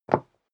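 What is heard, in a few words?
step leather shoes wood parquet single stair up, footsteps; schritte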